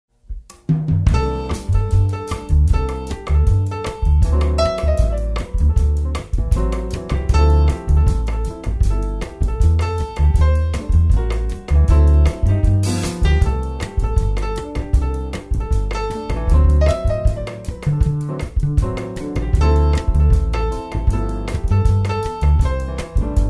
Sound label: Jazz Dance